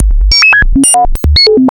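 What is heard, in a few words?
synth sequence; E-Lab; X Static Goldmine 1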